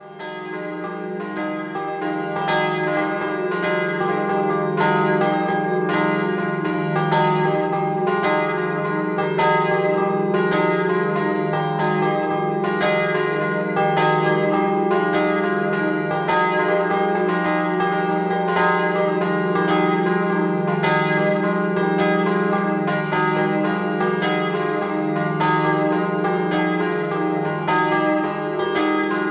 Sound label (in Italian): Tomba Scampanottata